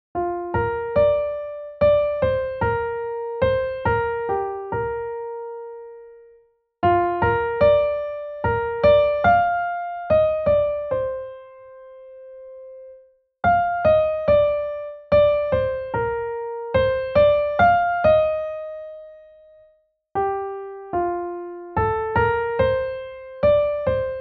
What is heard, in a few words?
Key of Bb (HIGH); Red River Valley